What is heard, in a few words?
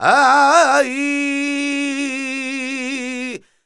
voc c, Flamenco Vocals Male Cantaor